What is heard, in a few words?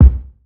old school kick drum single shot c key